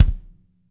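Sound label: bass drum, Drum 1 Dry; East Collexion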